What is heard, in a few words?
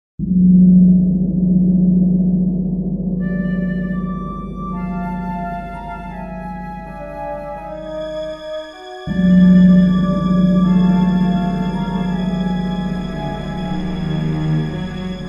Suspense; Gunman Chronicles